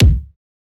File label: kick oneshot, Pop; Drums; SD PASADENA Pop Sample Pack; Slate